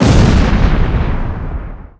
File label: Large Air Explosion; Defiance